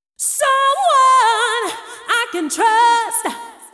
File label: deep house female, 125, A, vocals